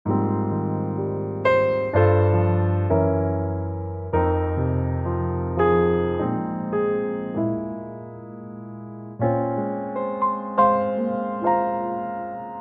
Ab piano

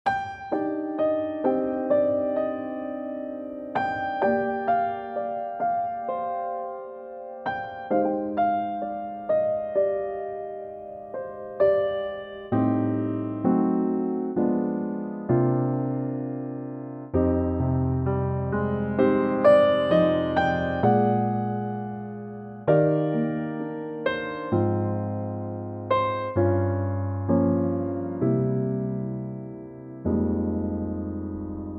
Eb piano; Pianotrak

